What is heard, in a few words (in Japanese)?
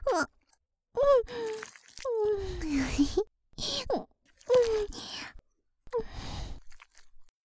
挿入; 愛好化(R-18)